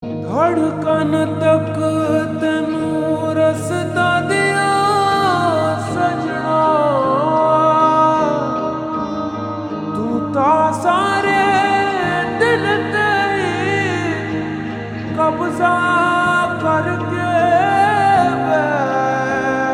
Ringtone Slowed Reverb

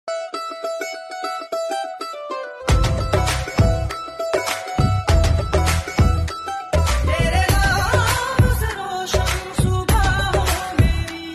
Ringtone

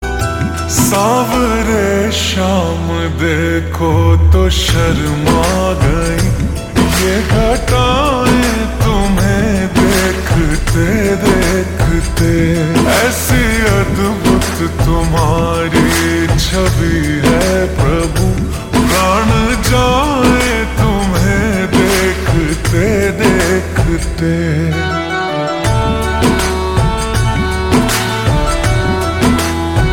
Ringtone Slowed Reverb

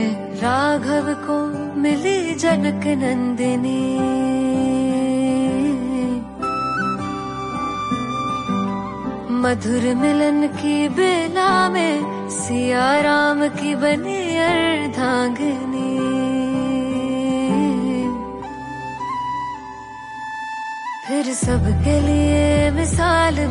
Ringtone

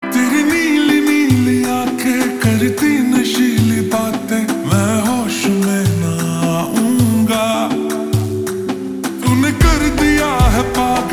Slowed Reverb Ringtone

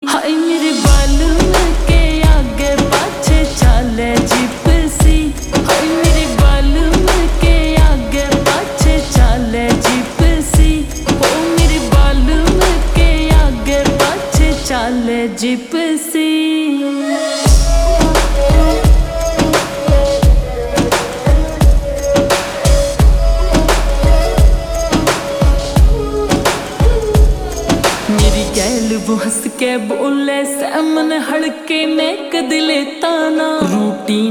Ringtone Slowed Reverb

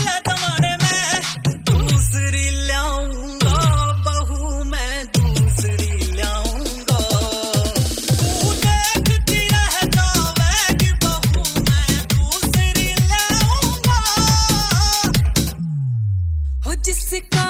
Ringtone

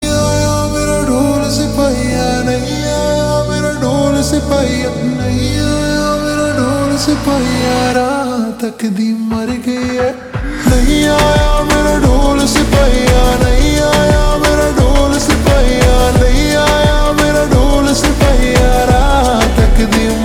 Ringtone Slowed Reverb

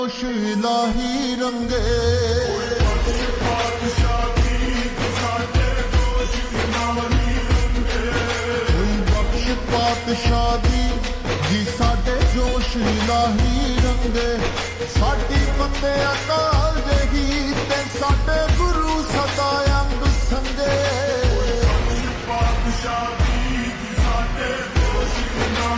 Ringtone Slowed Reverb

